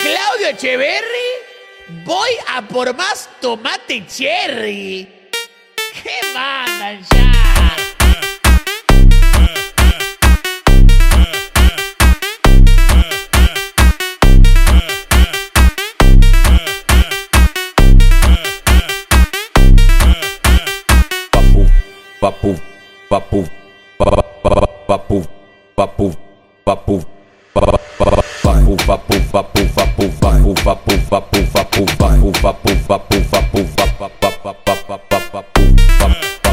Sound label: FUNK BRAZILIAN